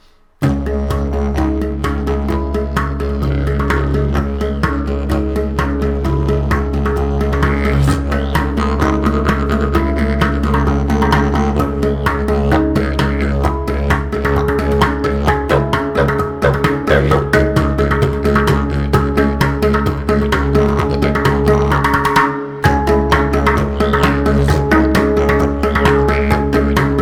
didgeridoo

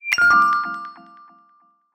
twinkle

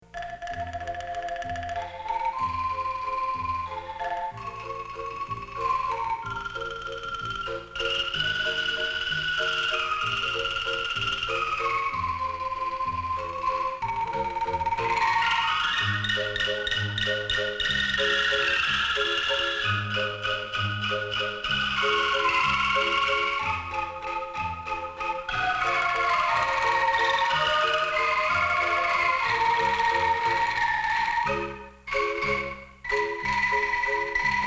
angklung

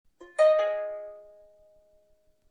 melodious

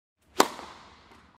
tennis

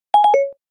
ios18 siri fail; Системные звуки и уведомления iOS 18 (iPhone 16, 16pro, iPad)

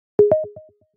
galaxy watch charging start

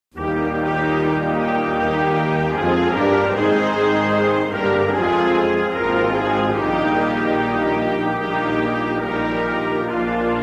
anthem

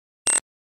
charging, nothing phone

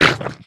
squid hurt, Minecraft

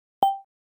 in call notification

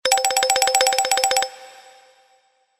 modulator, infinix; telefona infinix

